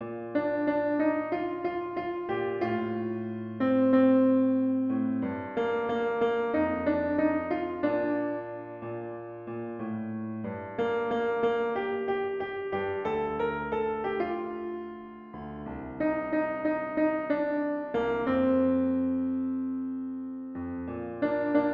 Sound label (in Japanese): ピアノ